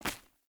Run Stone, Footsteps; Player; Mother

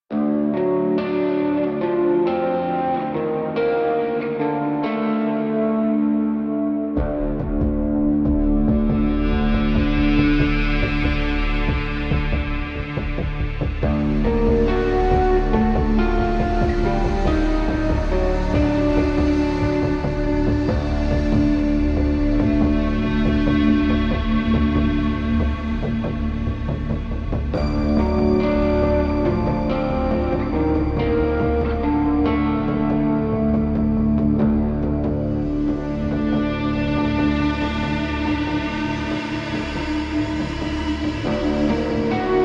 Hub Amb Cue, Music; Dynamic Music; 1999

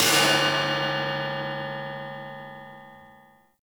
Light Mirror Hit Negative; Puzzles

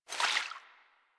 Vial Foley; Warframe; Warframes; Alchemist

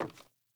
Sandals Walk Wood, Footsteps

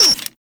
Minigame Slide Out; Warframe; Grineer

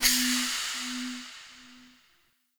Appear; Orlick; Friendly

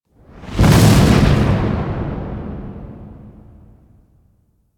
Destruction Heavy Hits; Ambience; Warframe; Corpus Railjack

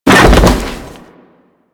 Fall Metal; Foley; Warframe; Tenno

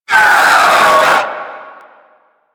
Swarm Vocal

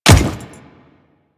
Default Step, Footsteps

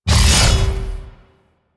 Mech Stab Ability; Nechro Mech; Vehicles

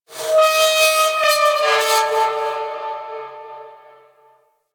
Asteroid Metal Groans And Scrapes; Ambience; Warframe; Grineer Asteroid

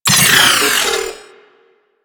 Vocal Reboot Deactivate, Enemies; Warframe; Riot Moa

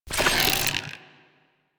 Bow Draw

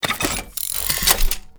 Sticky Bomb Equip; Grineer; Veilbreaker

